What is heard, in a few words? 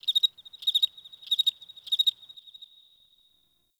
Insect Night; Warframe; Eidolon Plains; Ambience